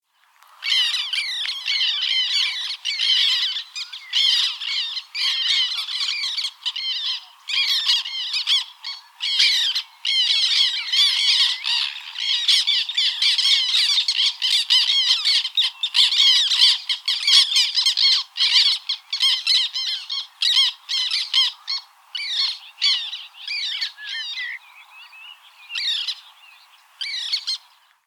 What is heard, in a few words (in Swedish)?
Svarttarna